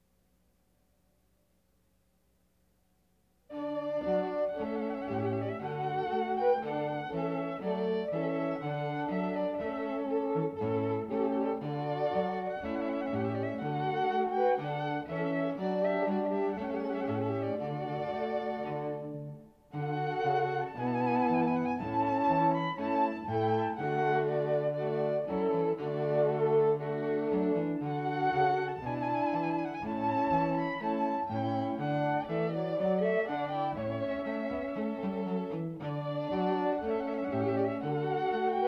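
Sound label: string quartet; Trumpet Voluntary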